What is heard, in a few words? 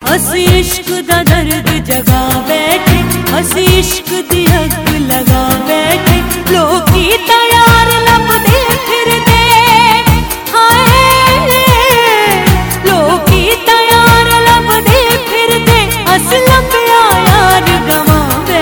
sad songs ringtones hindi